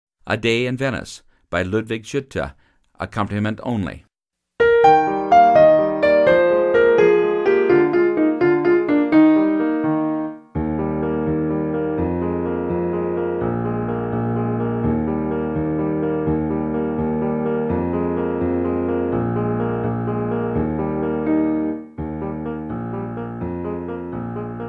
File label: Accomp only; Piano Only – Performance Tempo